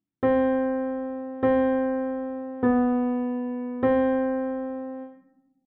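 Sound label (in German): Stimme Tenor; Richtige Stimmfuehrung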